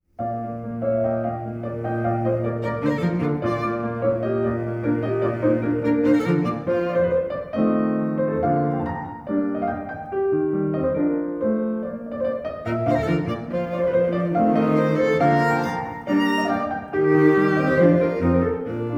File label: Trio; Mozart; Bb; Mvt 1